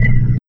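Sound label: Subtle HH, hi-hat; Sonic Foundry (Sony Creative Software); Esoterik Beatz; Subtle Kit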